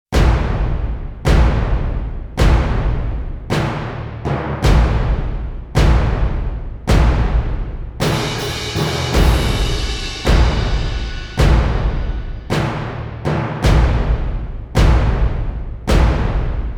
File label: drums build